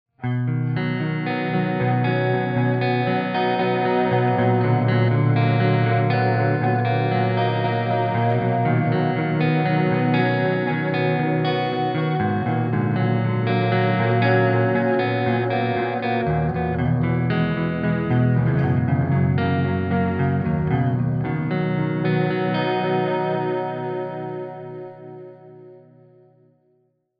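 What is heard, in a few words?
dark echo c major prog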